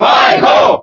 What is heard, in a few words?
Cheer German; SSBB